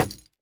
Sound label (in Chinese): 竹木悬挂告示牌破坏声